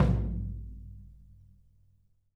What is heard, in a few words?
tom, drum